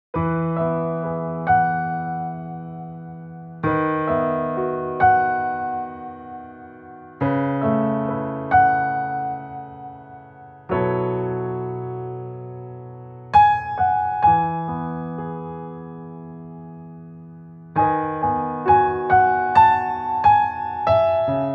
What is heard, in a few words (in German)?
instrumental